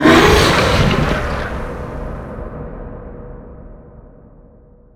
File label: flesh golem cast; undying